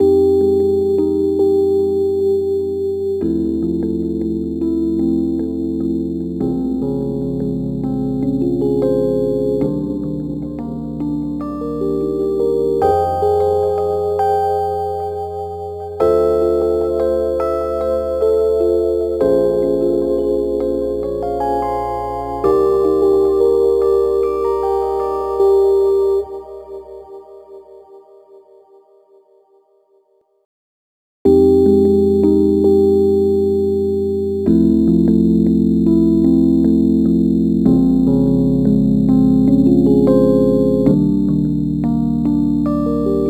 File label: Rhodes Attacks; Ueberschall